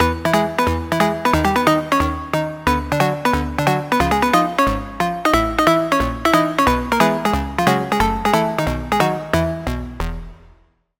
Pluck - Festive Bell; Sylenth1; Routed Sound; Sylenth1 Expansion - Story Walker Vol.1

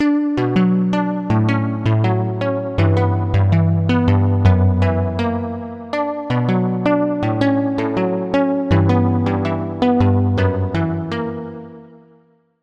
pluck, Sylenth1; Unspoken Words; Routed Sound; Sylenth1 Expansion - Street Vanquisher Vol.1

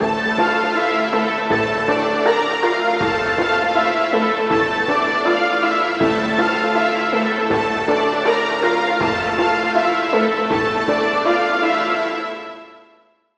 Key; Tough Journey; Routed Sound; Vital Expansion - Street Vanquisher Vol.1

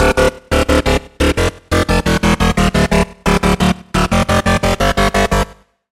Bass; Chained; Routed Sound; Serum 2 Expansion - Hyper Gamer Vol.1

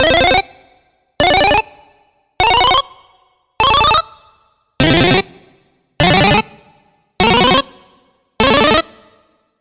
SFX Fire Flower; Routed Sound; Vital Expansion - Hyper Gamer Vol.1

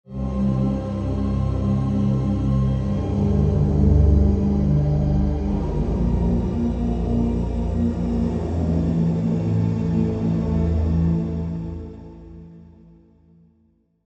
Pad - Space Distortion; Routed Sound; Spire Expansion; Quantum Enforcer Vol.1

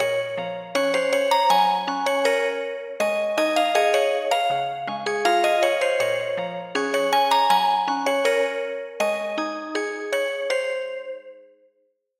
Bell; Routed Sound; Serum 2 Expansion - Story Walker Vol.1

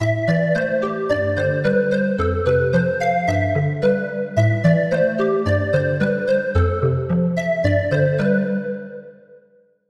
Woodwind; Troubadour; Routed Sound; Serum 2 Expansion; Story Walker Vol.1

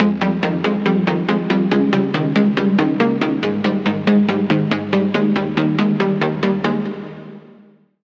Pluck; The Gate; Routed Sound; Serum 2 Expansion - Flame Summoner Vol.1

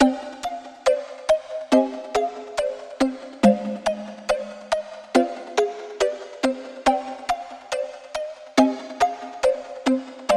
Pluck; Routed Sound; Spire Expansion - Street Vanquisher Vol.1

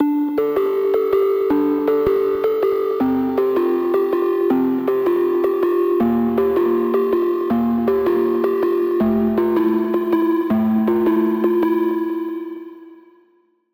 Pluck; Routed Sound; Spire Expansion - Flame Summoner Vol.1

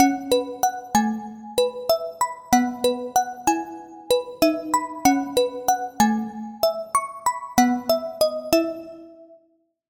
Bell; Routed Sound; Serum 2 Expansion - Story Walker Vol.1

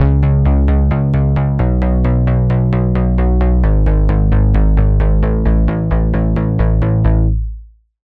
Bass; Routed Sound; Spire Expansion - Reality Maker Vol.1

